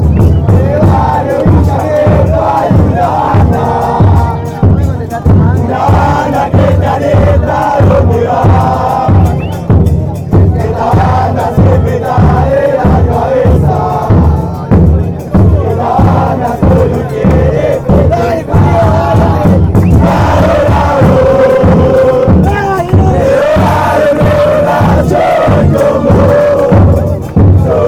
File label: atlanta chant, fanchants